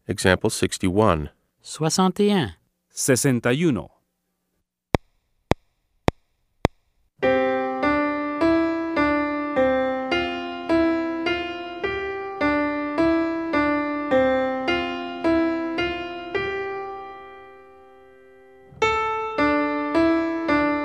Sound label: Keyboard